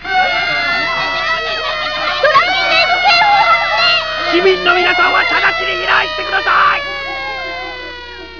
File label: alert